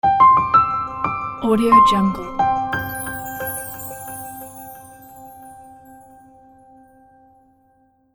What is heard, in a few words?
piano logo; logotunes